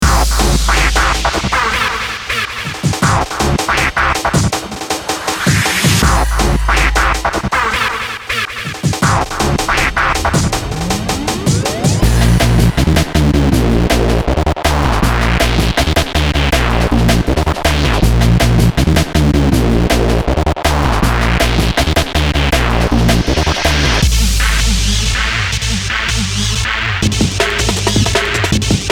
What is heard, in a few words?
Hoover DnB